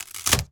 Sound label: wood panel break crack